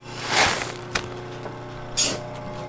Street work jackhammers

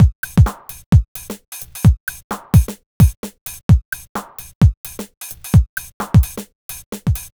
Club Dance Beat

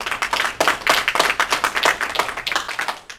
applause Light, group Of People

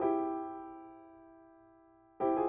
Latin Lounge Piano

